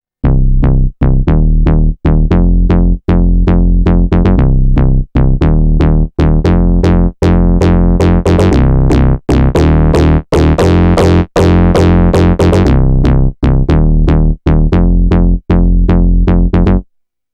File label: KORG Node; Not So Solid